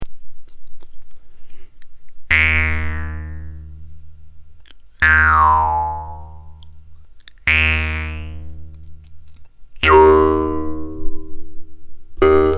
triangle

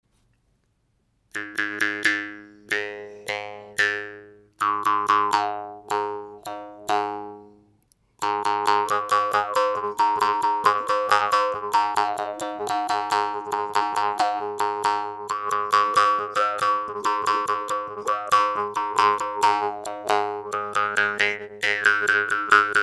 genggong

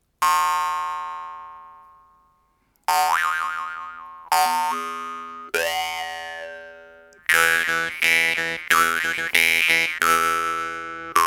DRUM

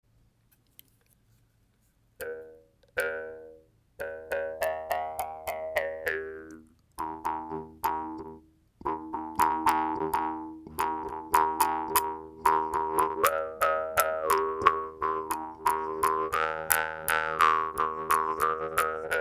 timor genggong

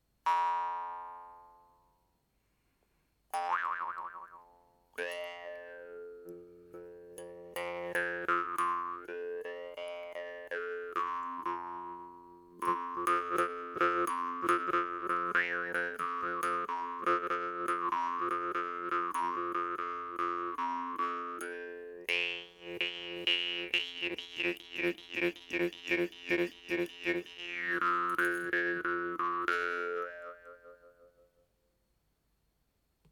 AFG XL, murchunga afghan style xl laiton

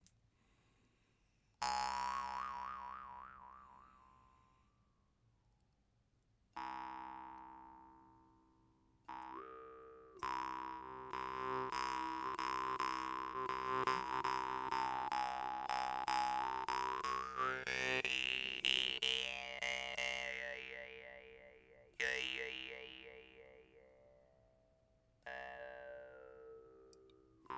sword

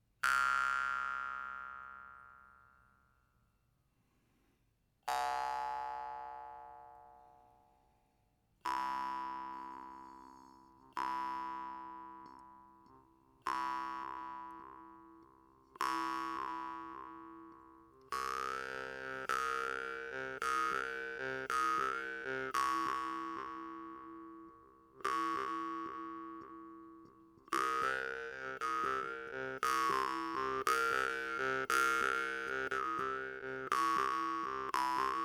bell

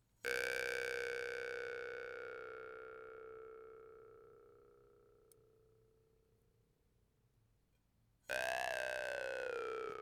boar, guimbardes

